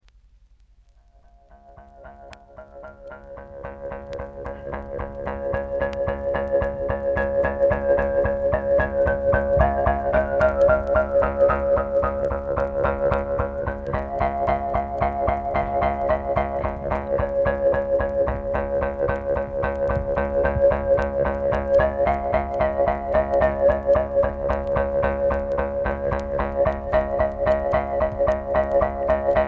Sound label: Nganasan Selkup mouth harp kypa pyngyr кыпа пынгыр